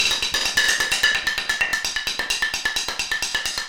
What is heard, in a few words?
Improv Percs 2 Bars 130 Bpm